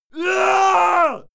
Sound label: male fight scream desperate; schreie; menschen; soundpool